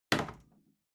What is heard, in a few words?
Megaphone Hardware Put